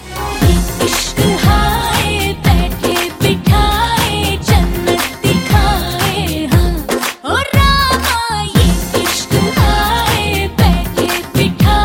hindi song ringtone; jab we met 2007

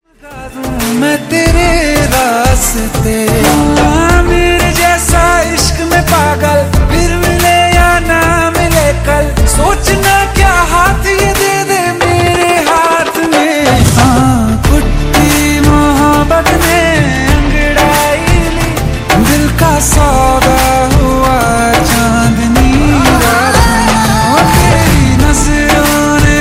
bollywood ringtone

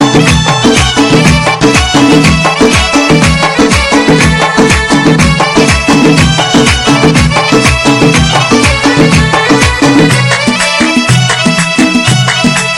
omani, ringtone

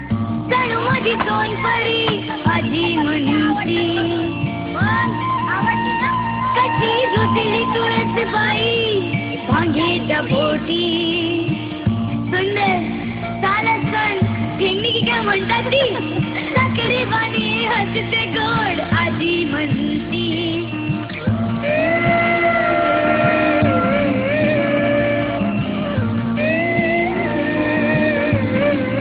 marathi sweet tone

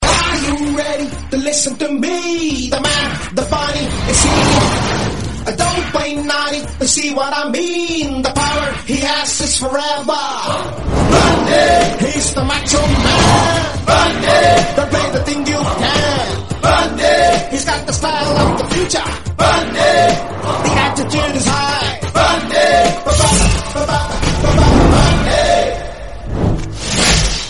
telugu ringtone

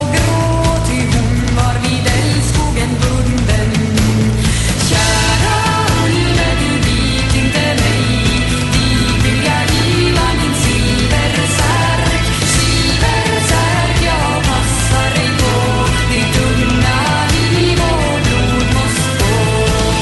swedish medieval

